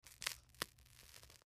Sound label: MedicalScientific; Soundfx III